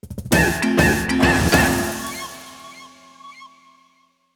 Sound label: Unlock Jingle; Snake Pass